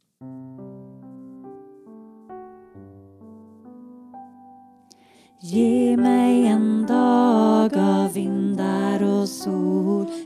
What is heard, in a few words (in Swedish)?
Ge mig en dag Alt